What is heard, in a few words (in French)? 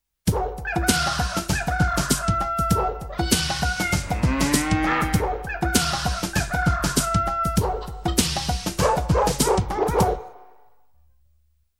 animaux